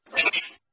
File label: slap for me